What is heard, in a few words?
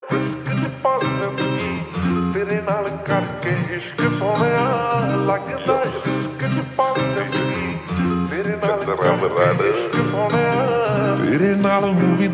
Slowed Reverb